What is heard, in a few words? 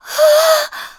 Gasp; Hilda gasping as the Triforce is restored; zelda